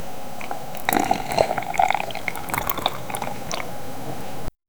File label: eevee is sipping coffee; food and drink